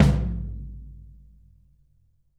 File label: tom, drum